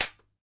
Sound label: FX metal; Sound & Vision - Gigapack II